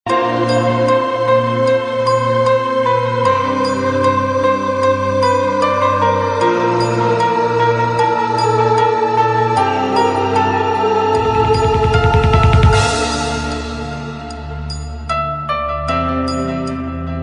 Jana Gana Paino

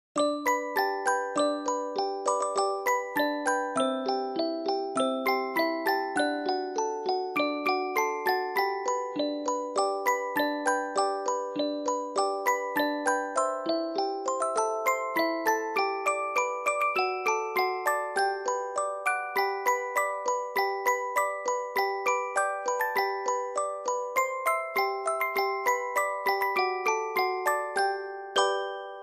Xmas Music Box

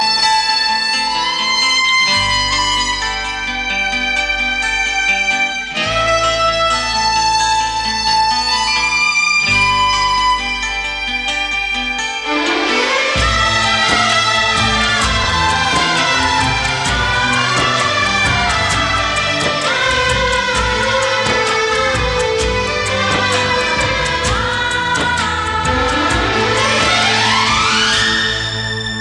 Sad Sad Music

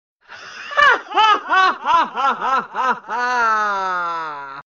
long laugh; meloboom

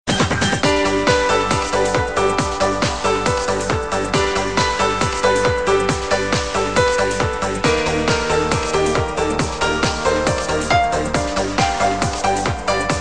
Music Ring

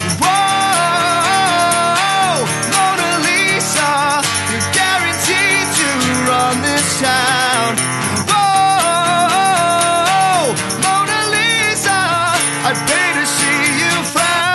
acoustic

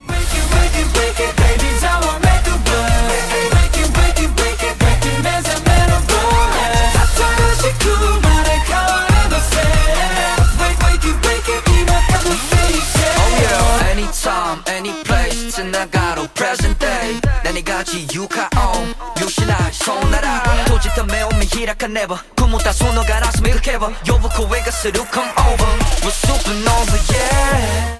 Wakey-Wakey CHORUS

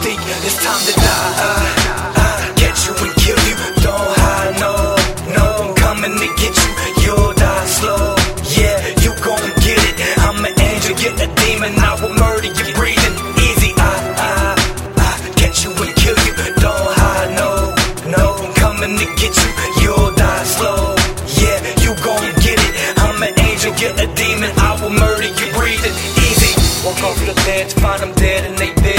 Breathing

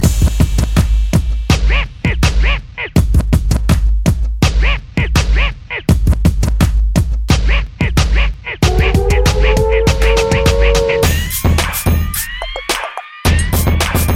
Inst

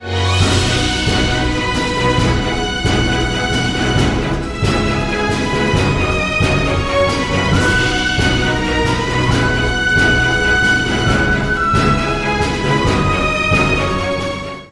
Theme Violin; GBF

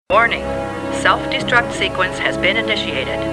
Warning

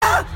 Jolting

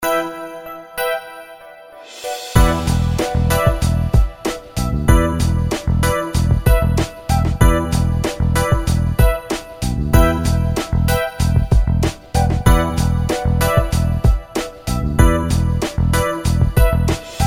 E Minor Hip Hop Tone

